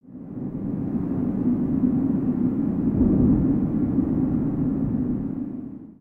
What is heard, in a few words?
Wind Cave One Shot; Lego; ambience